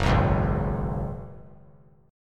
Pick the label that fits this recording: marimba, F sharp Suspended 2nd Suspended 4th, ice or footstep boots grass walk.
F sharp Suspended 2nd Suspended 4th